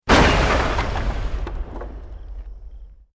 EXPLOSION MEDIUM WOOD

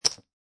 Poker Chips Dropping On Poker Chips

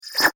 Suck It Up Swoosh

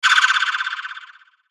Whoosh Tremolo Warble; Blastwave

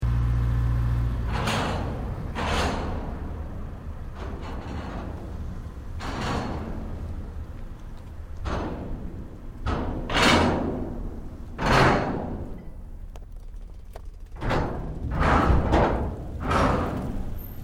Boat Ferry Car Unloading Car; Wisemans Ferry Nsw; Boats (Sound Effects:Vehicles)

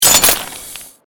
Created Magic Spell Ice Bolt Impact; Series of created spell sounds

